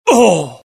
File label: death, pain; mohaa; dialogue